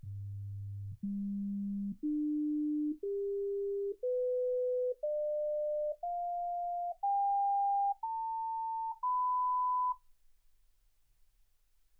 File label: sinus tones 48kHz